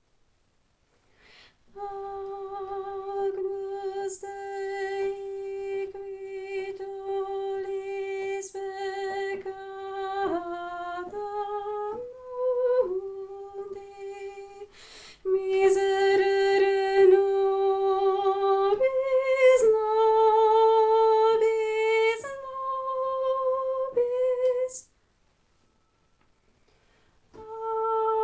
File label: tenor; Farnosť Letanovce